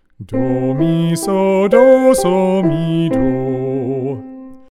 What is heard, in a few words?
Major Arpeggio solfege